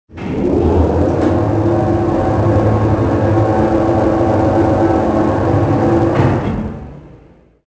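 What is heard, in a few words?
elevator; Soldier Of Fortune II Double Helix; XBOX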